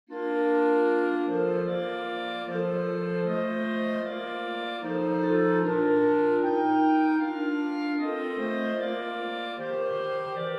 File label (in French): hymne national allemand; haydn joseph